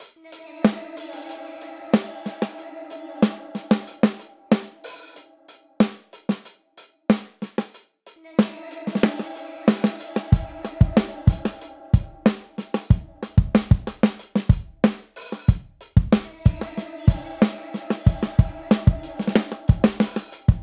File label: DRUM